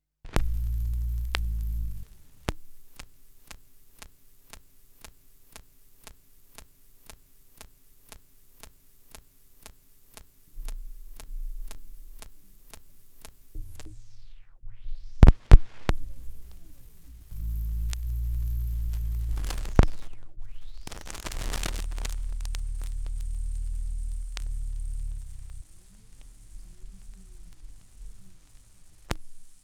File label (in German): schallplattengeraeusche, Vinyl